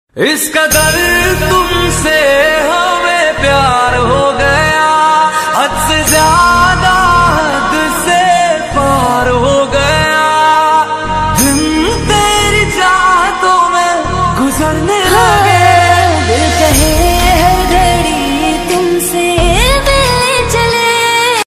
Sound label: love ringtone